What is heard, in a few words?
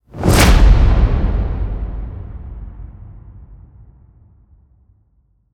Impact; Hits